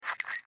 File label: Christmas Figurine Ornament Pick Up Bell; Foley; Holiday; Holidays; Blastwave